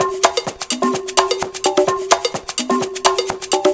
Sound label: Latin House Loop 128 BPM; Vengeance Samples